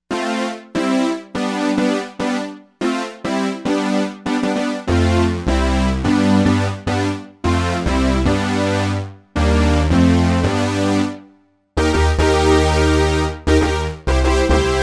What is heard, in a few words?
Saw Mass